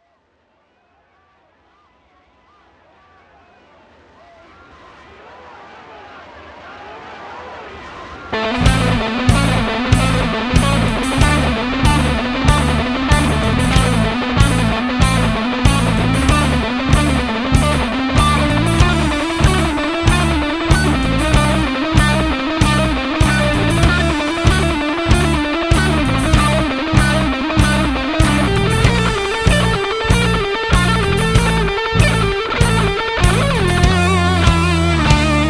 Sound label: instrumental